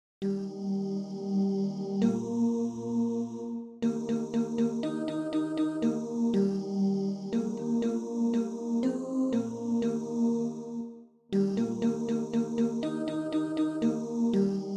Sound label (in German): tenor